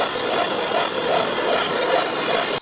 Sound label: hack spectre reprogram tones; dataknife; Titanfall 2